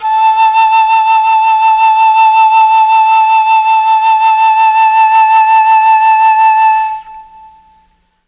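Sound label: A3 Chamber Flute, mellotron; CHMBLN FLUTE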